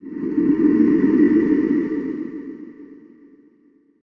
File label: silence